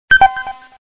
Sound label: Low Health; WoW Addons; WotLK Classic; MikScrollingBattleText